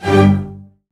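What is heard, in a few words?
String Section; CMI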